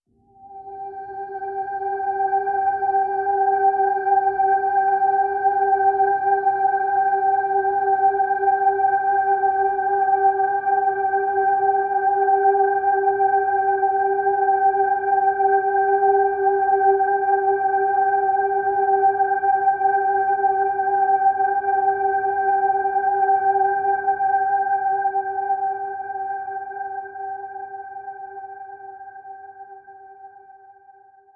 Harmonics G

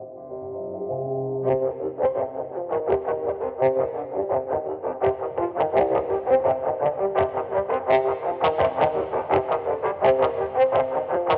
Soundscape

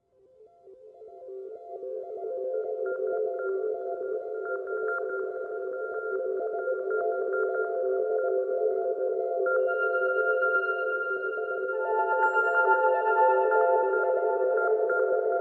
Networks, No Bass Pulse

